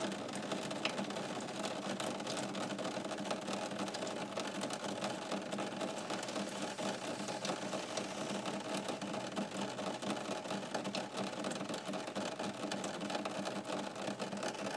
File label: what sounds like erratic popcorn is actually rain